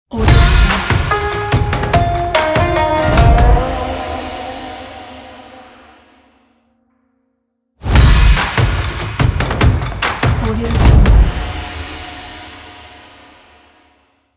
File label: asian logo ident; soundblasts